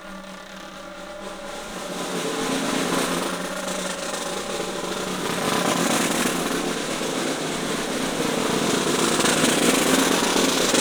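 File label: PICCOLO SNARE ROLL; 3D Sound; 3D Drums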